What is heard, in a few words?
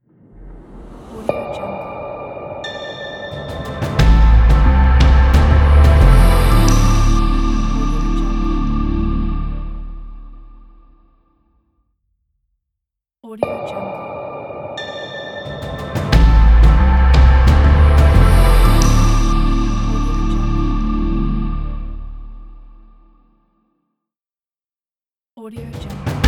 corporate logo